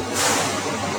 Air High, Train; TGV Sud -est; OpenBVE; Carmillon

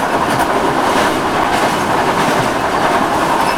Run, Train; OpenBVE; Cl313 Silverlink

